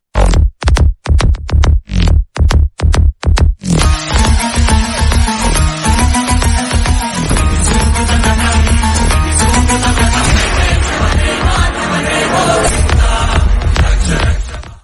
karthikeya bgm ringtone

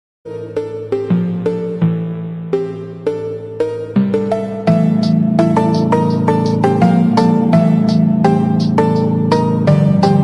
attitude ringtone bgm ringtone english ringtone

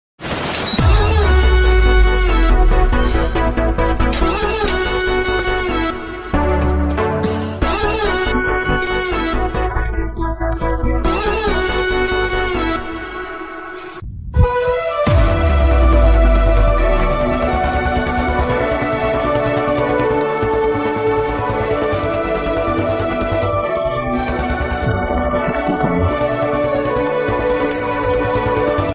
a1 express romantic ringtone

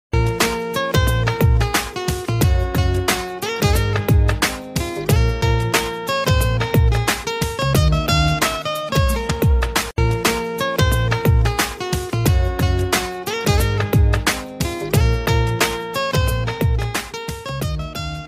romantic song; arya

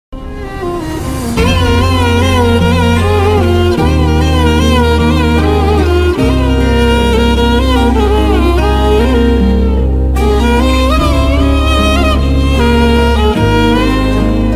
love ringtone malayalam ringtone; psycho

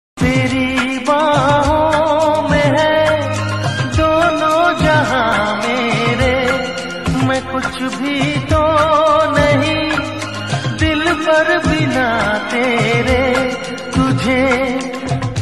old romantic song; sanjay dutt

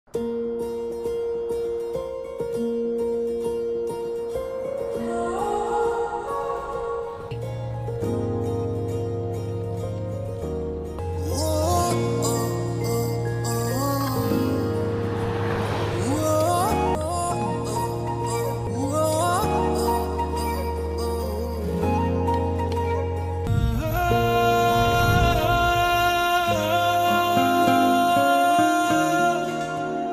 love bgm telugu; ananya

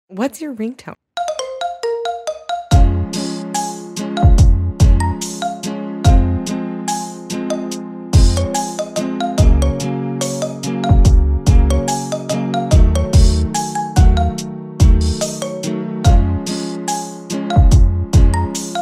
ringtone marimba remix; iphone android

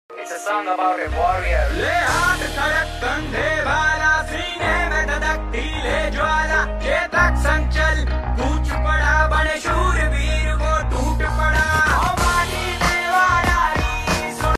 song about warrior; maharana pratap

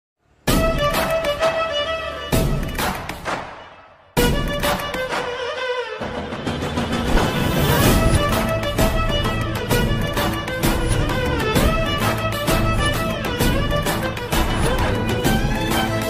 love bgm, telugu; manchi rojulu vachai

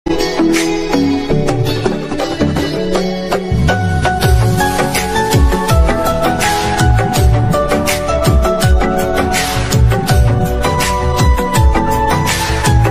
hindi ringtone love ringtone

